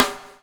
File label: BUBL, BUBBLE; Spectrasonics - Backbeat